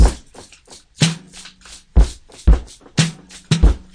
shuffly kitchen shaker 3 4 beat, DRUM LOOPS and BREAKS, 182 bpm